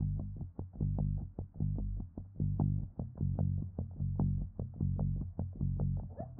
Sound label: chug, electric bass; Bass Line